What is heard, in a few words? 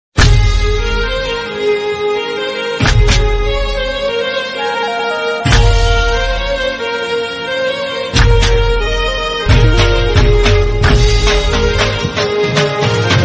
Instrumental Hindi